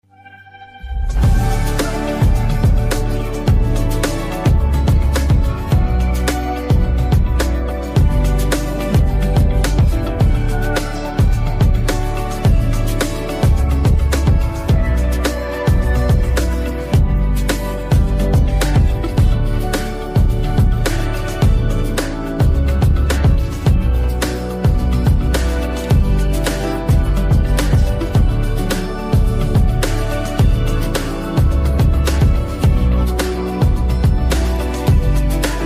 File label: instrumental